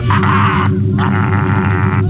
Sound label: laughs; space ghost; moltar